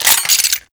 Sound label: Heavy Shotgun Reload Grab; Warframe; Tenno